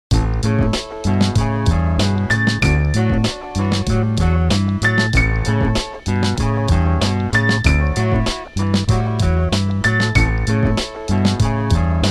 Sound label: Ballbounce, fun